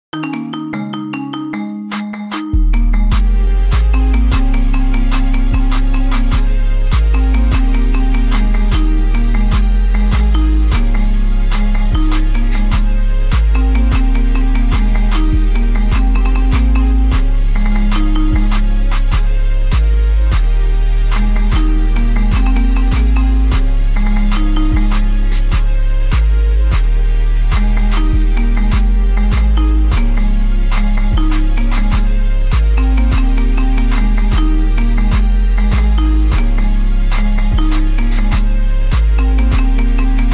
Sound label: Marimba; 着信音